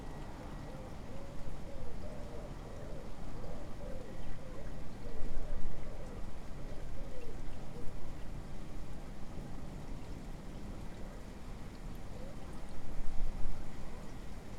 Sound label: July Rain Bird Train